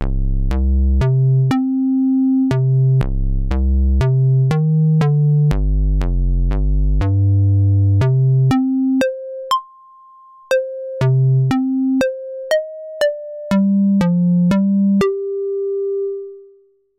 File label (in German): S1 Rechteck mit Filter Env